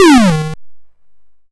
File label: Down Gun; SFX; YM; ChipShop Deluxe; ChipShop Hits